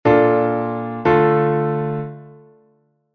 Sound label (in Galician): mal; armadura 1 bemol, dous acordes: si-re-fa-la; re-fa-la-fa